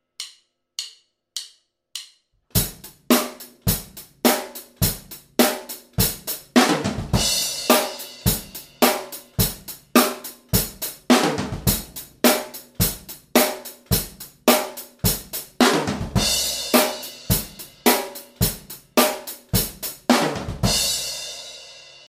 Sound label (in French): break passe partout, batteur